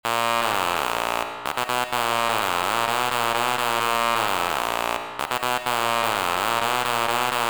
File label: Lead; PatchFactory